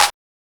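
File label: CLAP; E-Lab; X Static Goldmine 1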